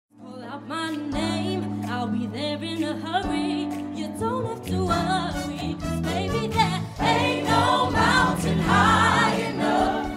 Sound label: gospel choir